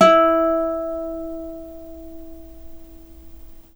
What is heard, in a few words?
NYLON GTR